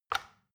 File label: plastic button tiny release heavy